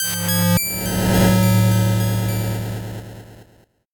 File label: 8bit jingle slow